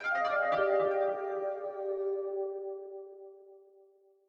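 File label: Menu Start, game music; Proteus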